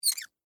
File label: Metal Hinge Squeak, Foley, Metal, Hinge, Squeak; Latch Sound Effects; Latches (Sound Effects:Objects)